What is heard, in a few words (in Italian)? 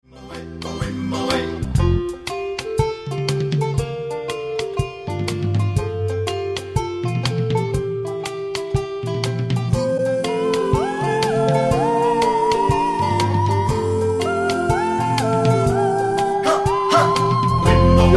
musica matrimoni; basi musicali professionali per matrimoni